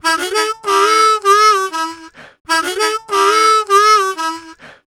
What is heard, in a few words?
Harm 098bpm A, Harmonica